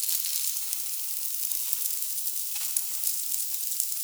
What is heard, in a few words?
cryo loop; Quake 4 Alpha; ambience cryo